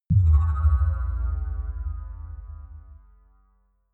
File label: sci fi bell bass